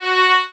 violin

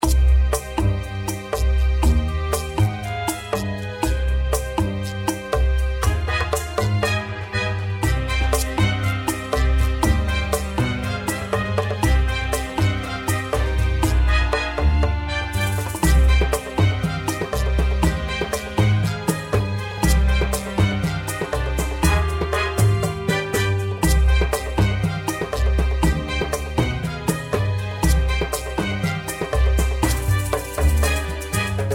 spooky tiki

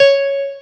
piano

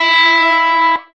electric guitar

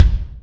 drum

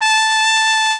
mexican trumpet

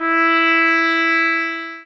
sax

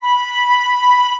flute

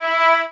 violin

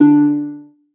lyre

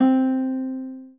spanish guitar

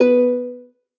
lyre

